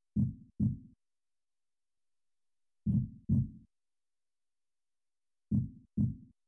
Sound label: Trance